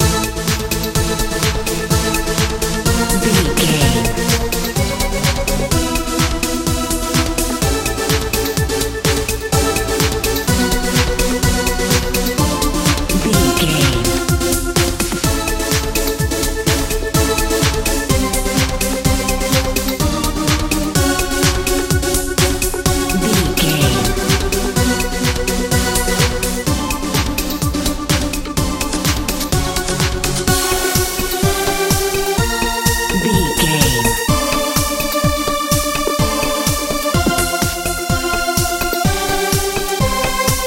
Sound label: modern dance